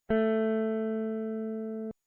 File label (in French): Guitare La4